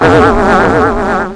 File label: pain; QUAKE2